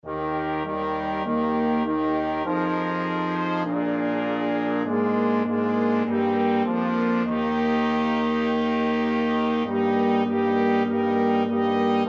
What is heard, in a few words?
flexible mixed ensemble, 4 players